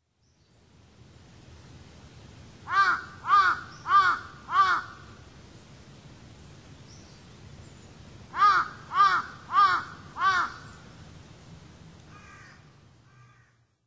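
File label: Large-billed Crow, Kokubunji; koe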